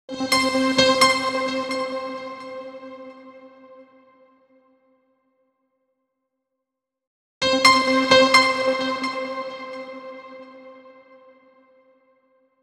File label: 131 house piano Cm; Dance DJ; Pro Tools Essential Demos; Digidesign; Essential Loop Demos; Demo Session - Dance DJ